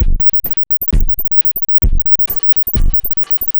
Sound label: Octave Down Loop 130BPM, DrumLoop Ghost Layers; layering